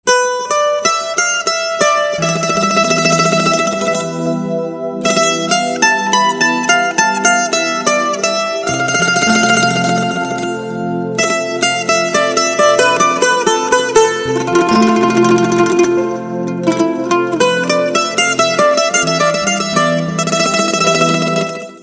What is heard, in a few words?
linh hon guitar